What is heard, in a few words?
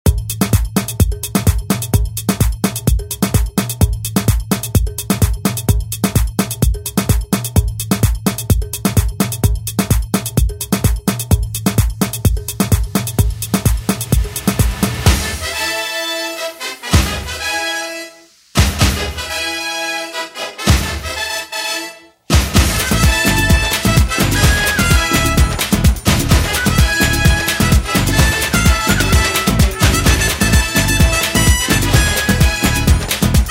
Latin Pop Redrum; Deejay Hit